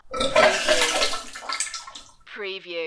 Vomiting

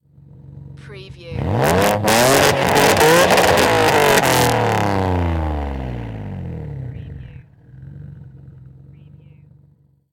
NISSAN 350Z EXHAUST MULTIPLE REV

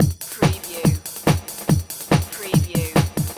Techno-Trance Drum Loop 142 BPM

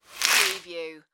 Window Blinds Lower; sound effects bible

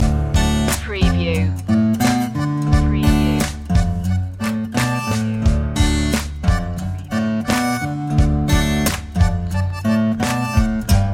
loosy bluesy loop

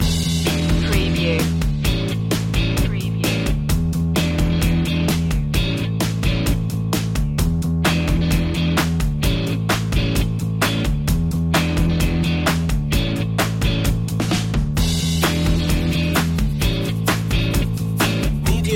re-rock-funk

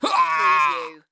Groan Pain Male

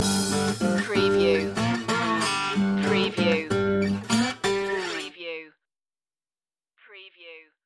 beats loop end

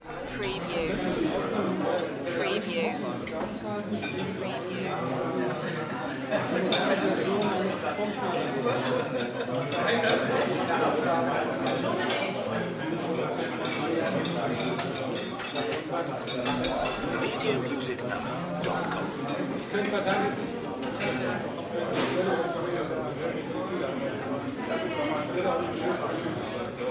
canteen ambience

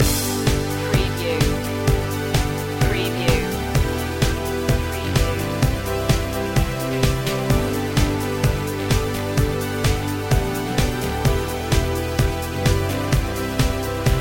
ultraviolet loop

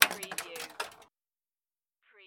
Pinball sound, clank with ball travel

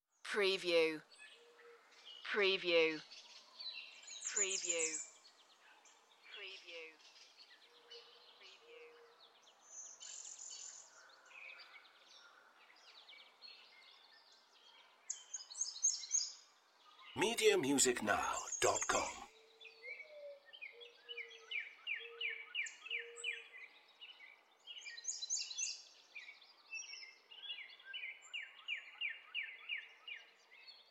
Nature Birds

